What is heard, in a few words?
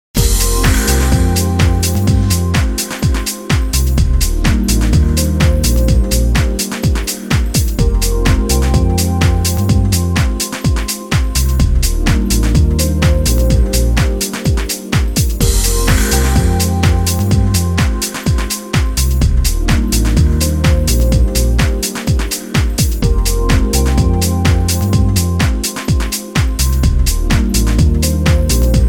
Summer Beat Lounge